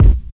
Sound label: nice kick sample c key, bass drum one shot tuned to the C note